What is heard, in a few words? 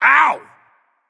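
nonverbal ouch; smite; voicepack; merlin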